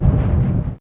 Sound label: small explosion